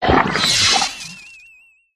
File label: worm attack voice middle